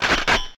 gun cock; revo-jailbreak

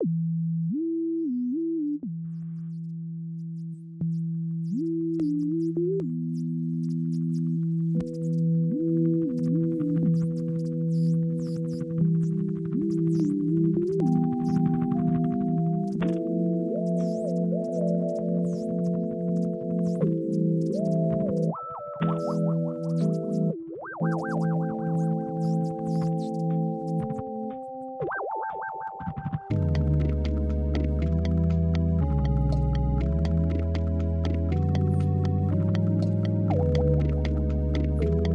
bug music; capri

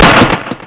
cop shoot; bullet casing sound; revo-jailbreak

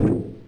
mech walk; hero-mark-2

